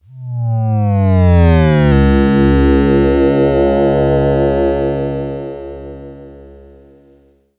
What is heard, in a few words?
Yamaha DX7 Descent C2